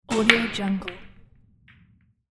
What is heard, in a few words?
billiard pool first shot